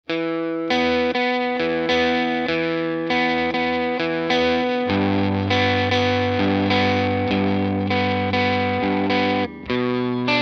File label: el gtr arp before after